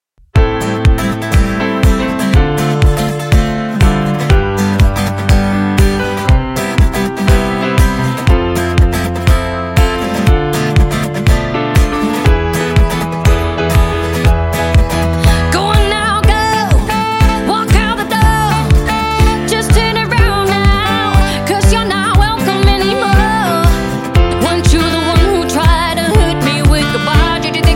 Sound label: Acoustic